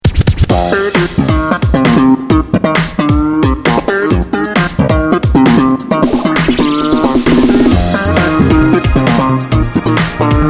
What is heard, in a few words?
Funky Chicken; Retrofunk; Very cheesy and out of place